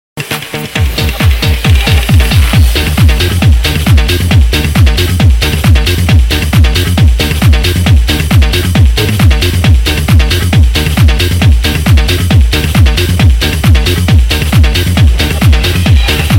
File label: Big Beats